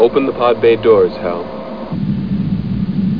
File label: open pod